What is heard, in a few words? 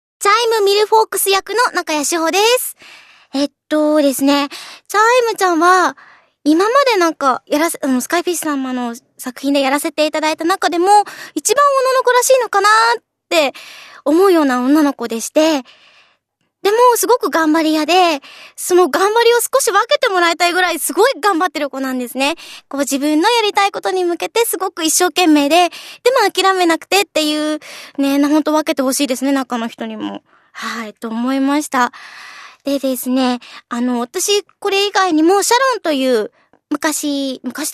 Chime